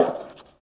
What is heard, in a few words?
bleacher step; Golden Crayon Sounds